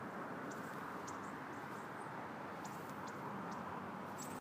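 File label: Junco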